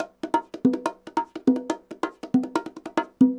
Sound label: bongos 140 bpm, latin; Sound Effect Library